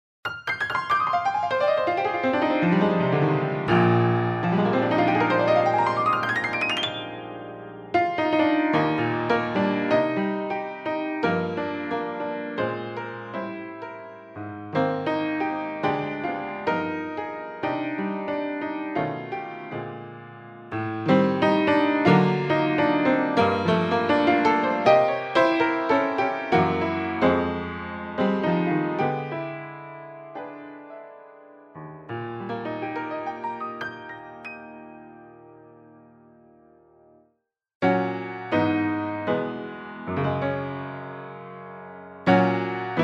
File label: Rain Piano